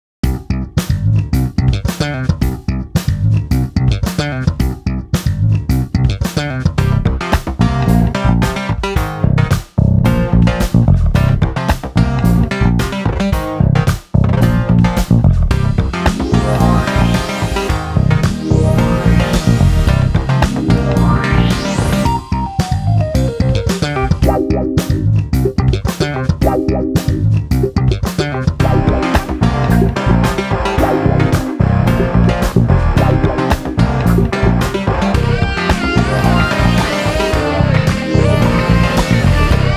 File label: loops to tune; weeklybeats-2020